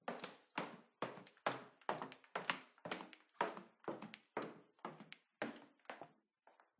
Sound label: household wooden stairs footsteps walk hard bottom trainers